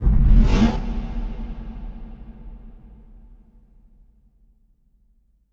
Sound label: impact flame, Cinematic Impact; M-Audio Pro Sessions; Liquid Cinema